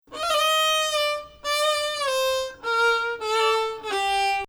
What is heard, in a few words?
Bayati Samai Nahawand; starts with flat-6 above Nah.; could be parsed as Kurd 8; Samai Bayati il-3ariyan; Nahawand 4